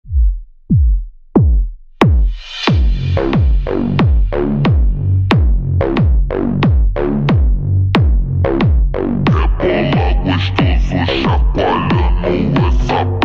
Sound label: phonk super slowed